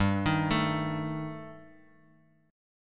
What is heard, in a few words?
G Suspended 2nd Sharp 5th, Guitar Open G